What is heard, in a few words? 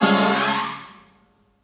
Flashover